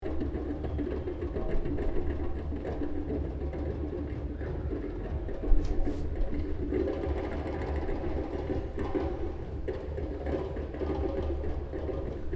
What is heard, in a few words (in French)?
fete freie trommler